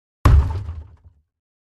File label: Large Bin Hit Sledge, plastic